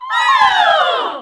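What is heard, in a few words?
Classical Sing, choir; Best Service Gigapack 1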